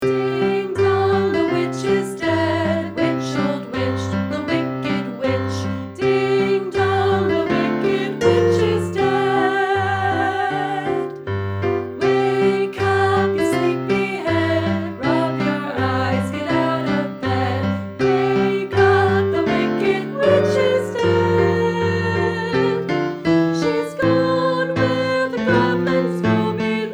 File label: ding dong